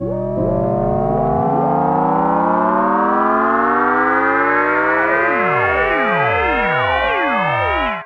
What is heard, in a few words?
power increasing